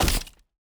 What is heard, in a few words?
fly walk